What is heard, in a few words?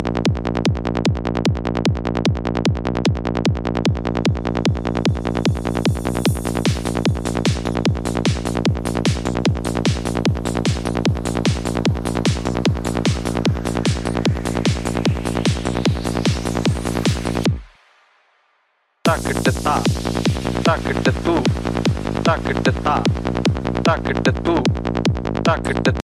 ringtone